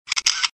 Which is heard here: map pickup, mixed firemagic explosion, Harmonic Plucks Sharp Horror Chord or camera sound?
camera sound